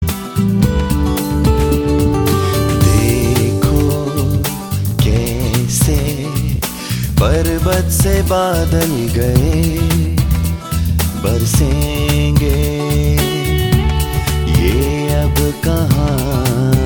Strings

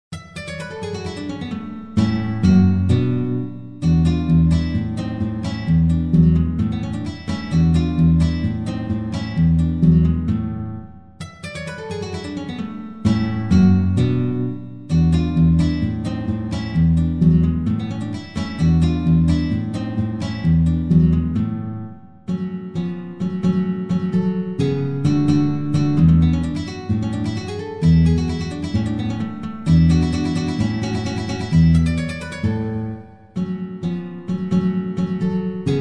allegro con fuoco; Op. 1 (vaterlands-bluthen) part 3

